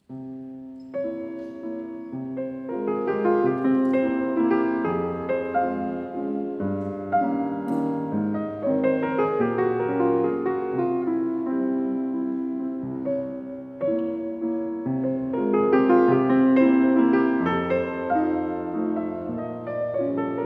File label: Mazurka for piano No. 40 in F minor; The Piano Collection, CD 2